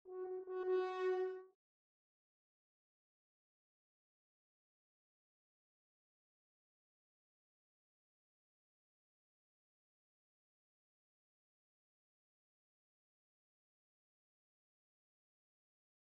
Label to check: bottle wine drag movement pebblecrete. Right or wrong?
Wrong